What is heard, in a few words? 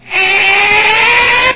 alert